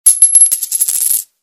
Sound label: coin falling; ringtones; sms